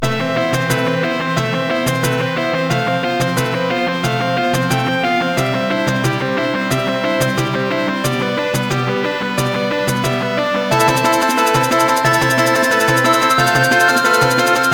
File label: MIDI Techno Rush; Furdiburb; Android